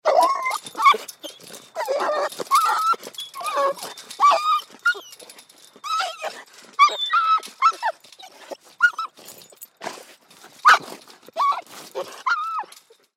Dog Great Dane Whimper Bark Pain Collar Fence Rattle; Animal; Dog Sound Effects